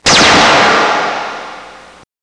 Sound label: LASER, MILITARY; Easy Personal Web Page